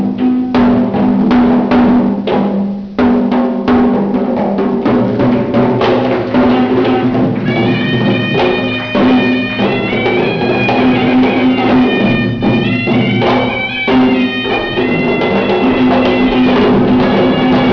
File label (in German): trommeln; srilanka